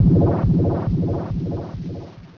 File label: Synchronoise; pokerogue; battle anims